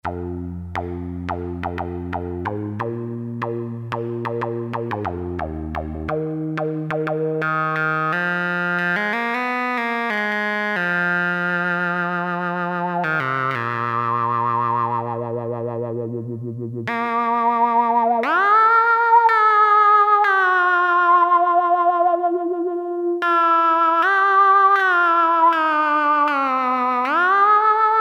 Alto Sax + wha wha + portamento, Farfisa Syntorchestra Mono